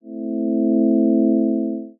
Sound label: synth pad